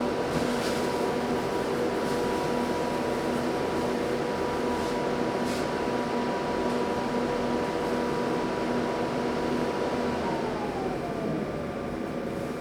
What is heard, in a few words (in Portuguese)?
Quarto de maquinas muitos geradores ligados; equipamentos